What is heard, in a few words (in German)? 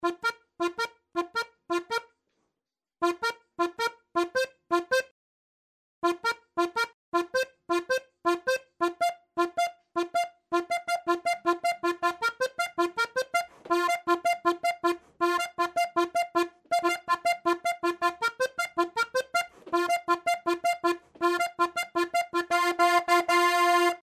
80 bpm ziehharmonika